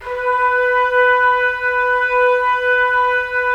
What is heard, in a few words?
Trumpets; Roland - LA Composer vol. 4